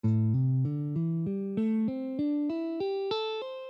A Pentatonic Minor, guitar

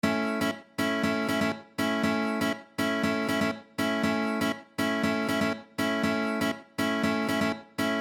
Rhythm, guitar

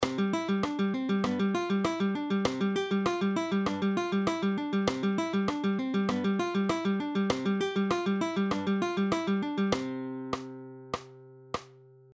Alternating bass Fingerpicking, guitar; Plucking Lesson 9; fingerstyle; matteo carcassi music

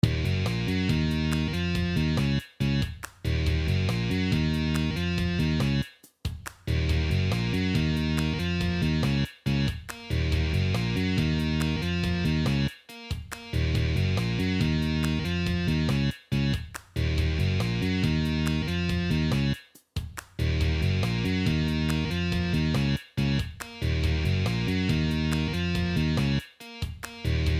easy metal riffs, guitar

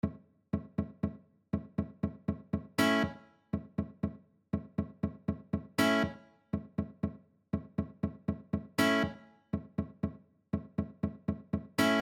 Rhythm, guitar

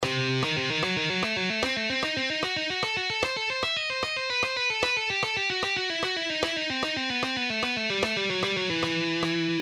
Shredding Exercise

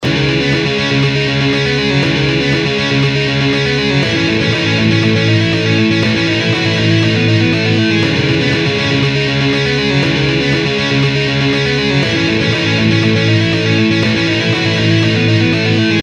Guitar Dynamics

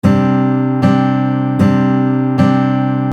How sus2 sound like, guitar

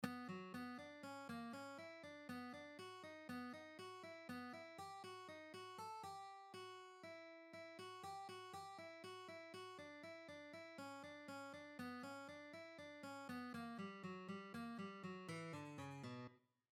Alternate Picking Exercise, guitar

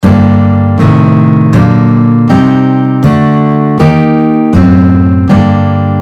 G harmonic minor chord scale, guitar

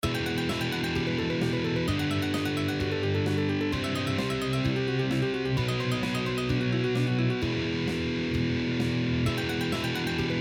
String Skipping Exercise

